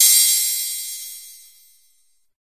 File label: freeze